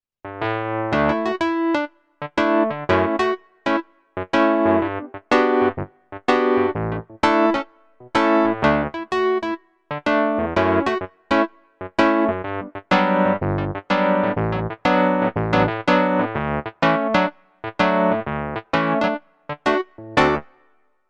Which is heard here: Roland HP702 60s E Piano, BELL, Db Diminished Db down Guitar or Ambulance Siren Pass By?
Roland HP702 60s E Piano